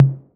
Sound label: TOMS 808